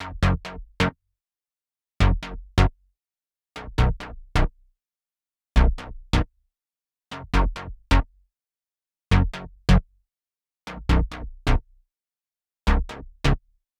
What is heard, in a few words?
135BPM Bassline DRY, F# minor